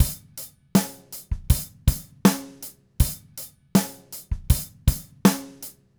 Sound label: Green Maple Groove 80, Vital Drums, DW Green Maple Kit, 80 BPM; Sonic Foundry (Sony Creative Software)